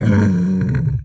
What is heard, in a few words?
DragonTurtle Death